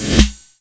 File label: Reverse Hatter Hit, TR-606 Bent Samples; Sonic Foundry (Sony Creative Software); The Electronic Music Manuscript Collection; Battery 3 Sample Kits